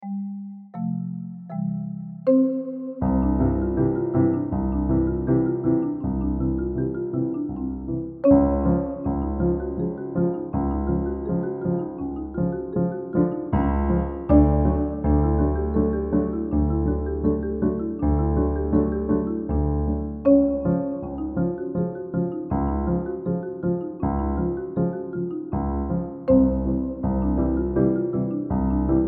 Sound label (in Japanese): リップ＆タングロール発声練習 伴奏のみ